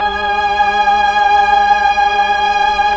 PAD, Slow Str+Vox

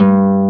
HARP G1; E-MU Formula 4000 Series Vol. 5; Protozoa; Proteus2 Instrmt

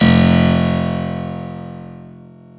KEY HRPCR, Roland; Roland - LA Composer vol. 1

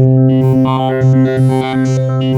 WAVE SEQ, KORG; East West - Global Sounds; WS KORG#2

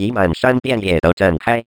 vocal fx 138, Techno; Best Service ProSamples vol.45 - Techno ID; atmo+fx-loops

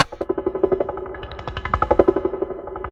Snake; Jungle Warfare 1&2

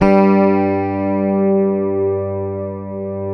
FINGERED, Bass; G2; Sampleheads; Will Lee Bass Library

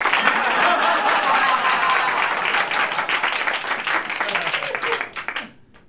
CLAP HAHA; ClapsOohsAahs; SFX

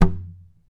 TAP, FLAMENCO Guitars

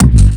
RSS, 160 BPM; Ueberschall; Drum & Bass Flash Trackz

